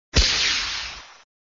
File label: Sound SFX, flipper; sttng